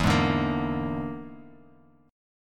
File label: E 7th Flat 5th, down, Bass Standard